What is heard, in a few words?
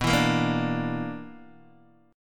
B-Minor Major 7th Double Flat 5th B down Guitar DADGAD